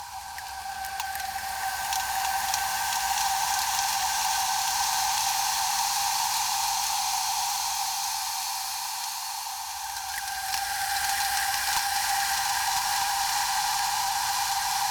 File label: flora seamless